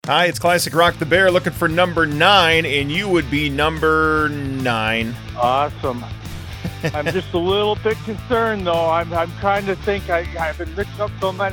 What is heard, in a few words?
riff